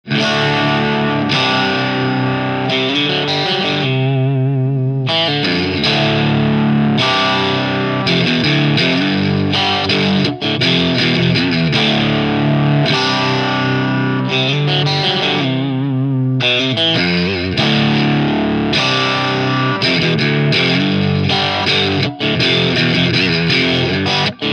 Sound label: strat 2nd pos drop d, guitar